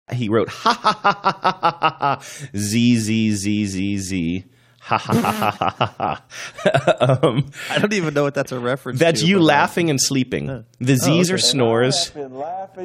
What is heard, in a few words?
laughing snoring